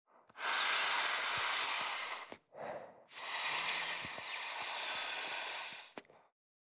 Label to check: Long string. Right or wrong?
Wrong